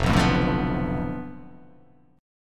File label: F 6th Flat 5th F down, chord